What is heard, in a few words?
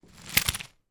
toy vehicle pass on carpet then roll